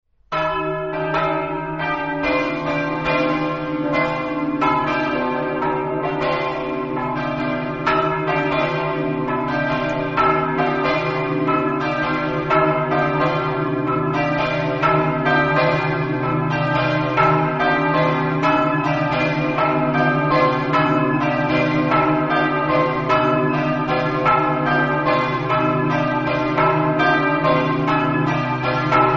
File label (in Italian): torreano, campane